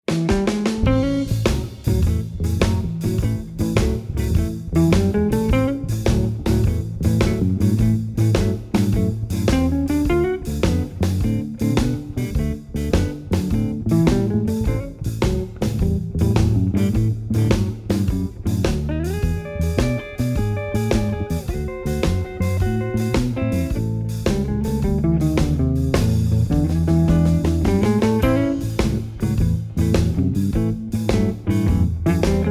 Style Blues Drumming

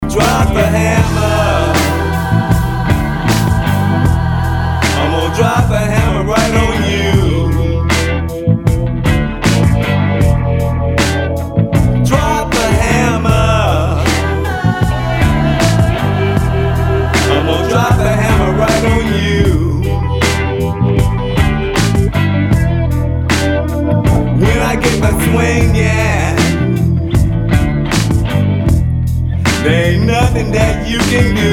Urban Blues

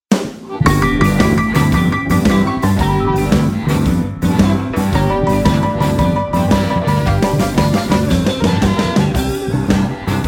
Chicago Blues Shuffle

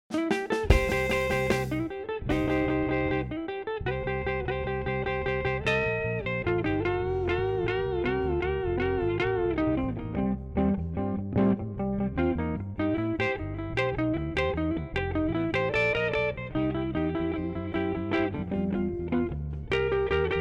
Blues NO DRums

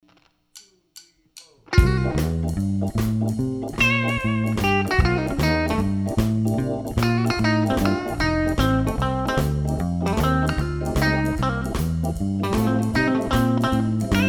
Style

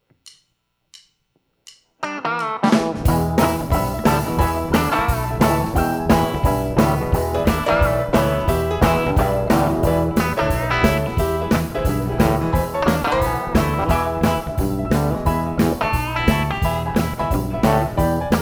Delta Blues Drumming

